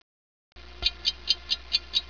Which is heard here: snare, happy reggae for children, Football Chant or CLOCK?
CLOCK